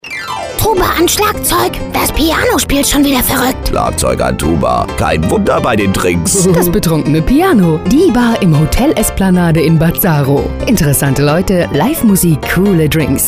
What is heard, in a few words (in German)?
Das Betrunkene Piano